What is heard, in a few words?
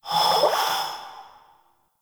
Grineer Frogman Breathe; Warframe; Breathing